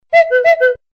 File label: Samsung D500 SMS TON